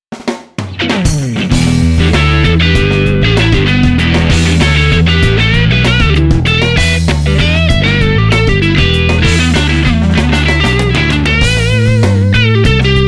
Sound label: patch demo